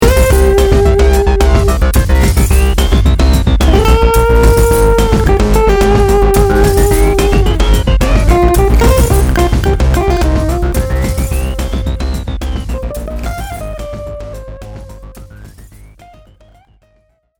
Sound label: electric acustic